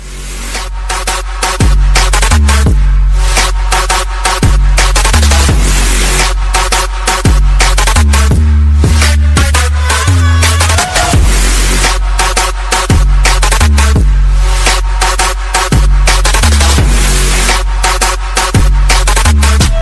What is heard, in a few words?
english music; english ringtones